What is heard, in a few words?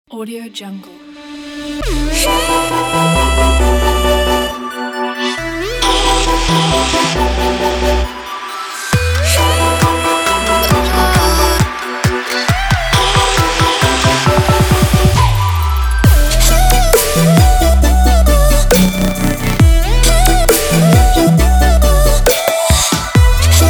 future bass